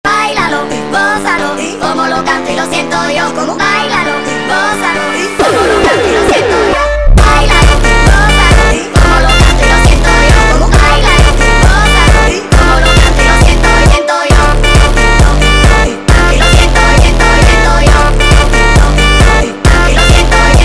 Jumpstyle Sped Up

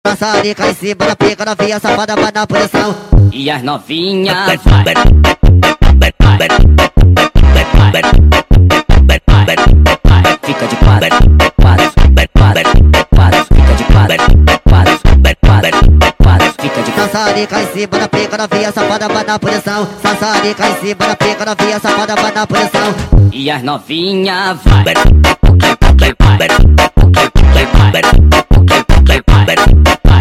Montagem sped Up

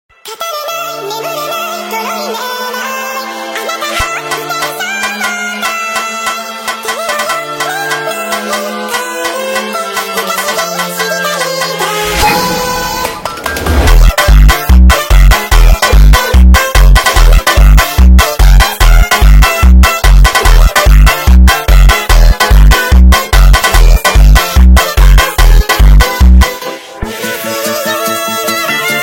FUNK Sped Up

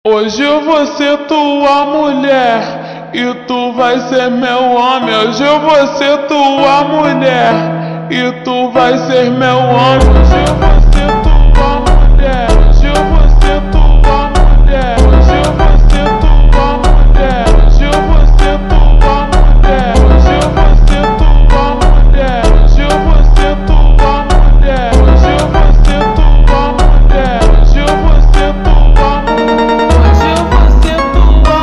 Funk ultra Slowed

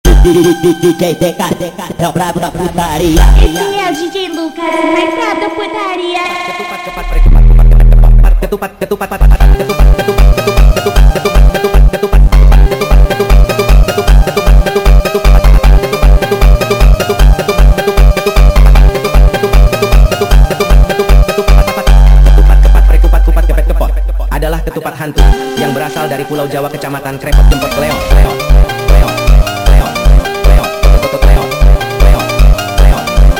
V2 Sped Up

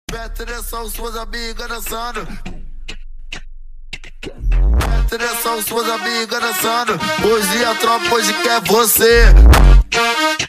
Funk ultra Slowed

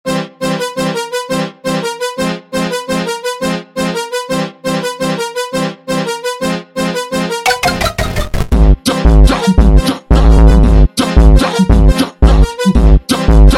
Carnaval Funk slowed

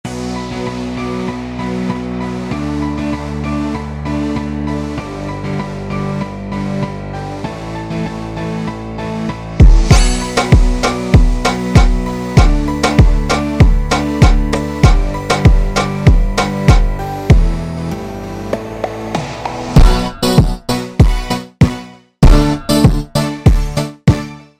Funk super Slowed

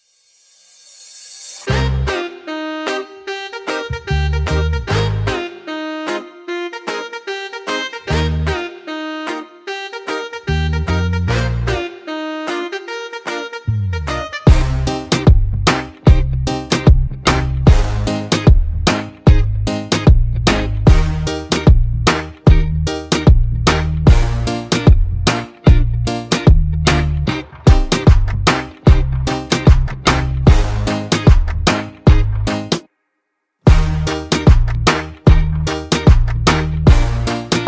Siren Beat Remix instrumental

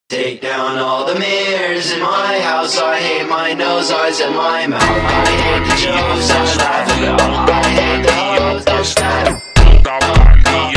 funk Super Slowed

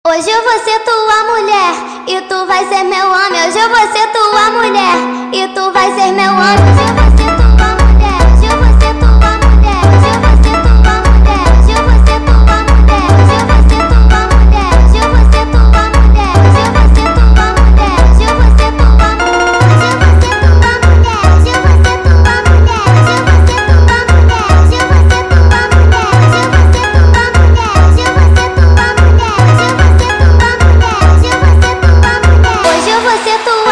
Funk speed Up

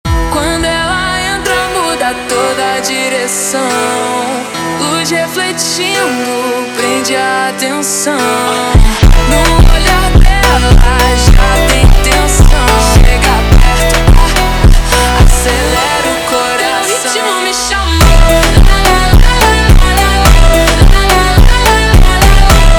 Funk slowed

